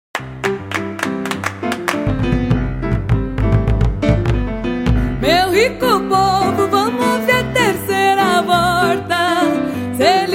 cantora

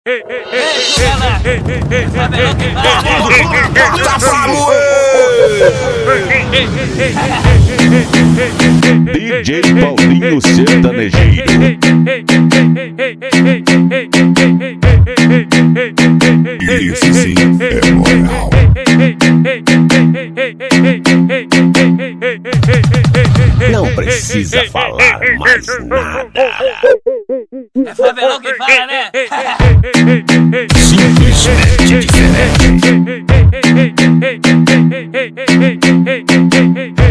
funk sertanejeiro

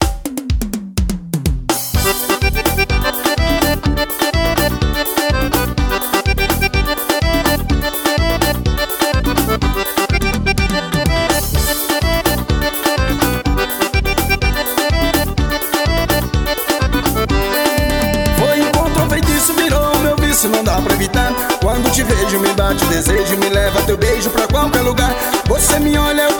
forró

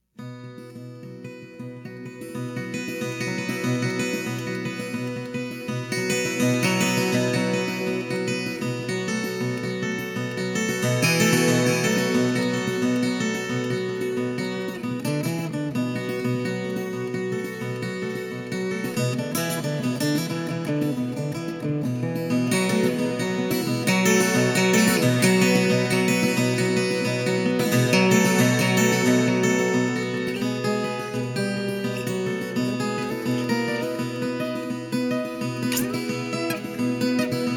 viola destoada instrumental

